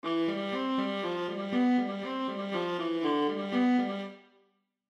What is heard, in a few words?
Alto Clef Example Viola